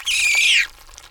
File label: Insects, insect die